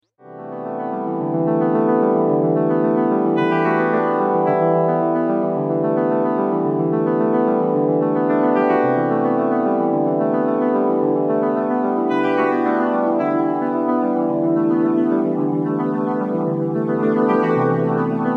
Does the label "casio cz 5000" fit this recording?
Yes